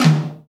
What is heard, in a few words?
tom drum single hit d sharp key, tom single hit tuned to the D# note